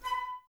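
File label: flute, Woodwind; Miroslav Vitous; Woodwind Brass Ensemble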